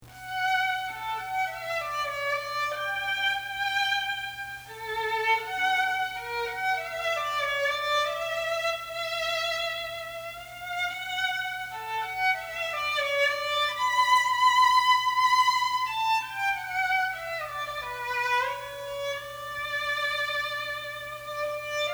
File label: salut d amor solo